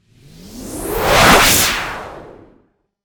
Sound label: whoosh vento